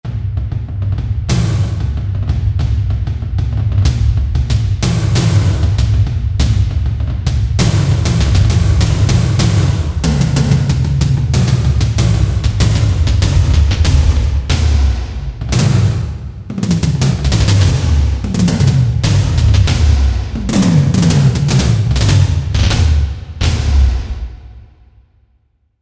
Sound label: Lethal Drum Hit, Korg TR-Rack